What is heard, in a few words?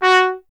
trumpet, F#1; Miroslav Vitous; Solo Instruments VOL-1